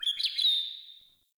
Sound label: Cave Bird; Ambience; Caves; Glades Of Andurin